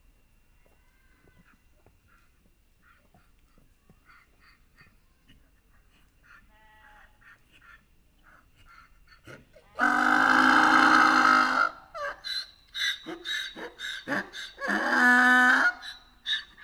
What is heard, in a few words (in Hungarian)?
magyar parlagi szamár; szegedi zoo 2011